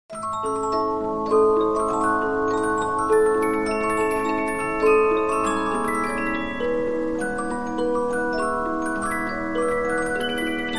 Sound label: Polyphon, 15inch disc musicbox